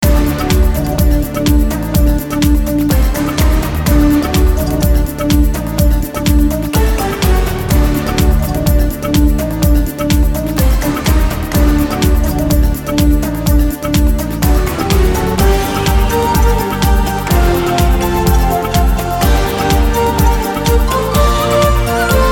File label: free spin melody; Golden Book